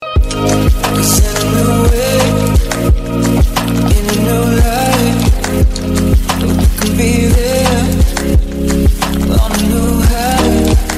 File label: newest ringtone